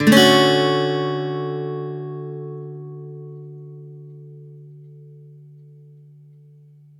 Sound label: D maj7 downstroke, Acoustic Guitar; Sonic Foundry (Sony Creative Software); Songwriter Acoustic Guitar Companion; One Shot Chords